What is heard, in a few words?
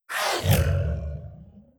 Traphideous VO